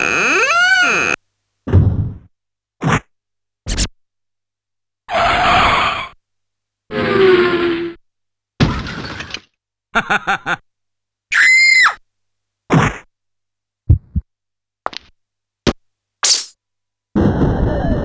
Yamaha PSR-E323 SFX Kit

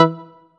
Chord; ModelCycles

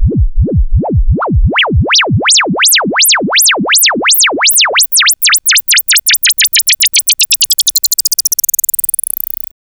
FX SWEEP, SYNTHSWEEPS

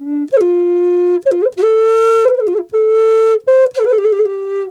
D wild flute; Big Fish Audio - Didgeridoo and Other Primitive Instruments

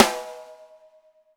6X12 ALUMNUM, Living Drums

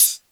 HH, Dance Mega Drums; Best Service; HH-HIP

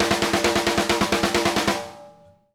SNARE, drum fills; Sampleheads - New York City Drumworks VOL-1

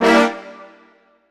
Brass Stab; Synthetic Winds; Dr. Fink's Funk Factory

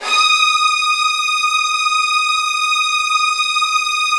D#3, Virtuoso Strings; ILIO; Kirk Hunter Virtuoso Strings VOL-1

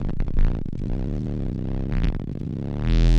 Swoop Bass, Drum N Bass; Sonic Foundry (Sony Creative Software); Knutrix Drum & Bass

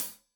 Hat Tight, Hi-Hat, Cymbals; Hat Tight 1; Stereo; Deeply Sampled Drums

